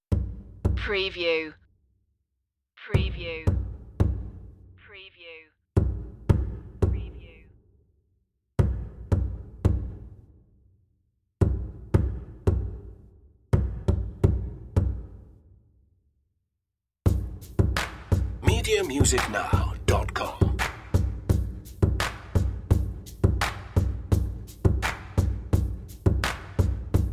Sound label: hip hop bach prelude perc only